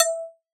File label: Lead Shot E Bell, Dubstep; One Shots; Ghosthack; Dubstep Sound Arsenal